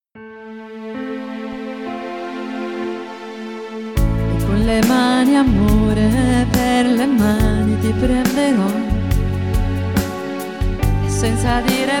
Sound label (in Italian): soprano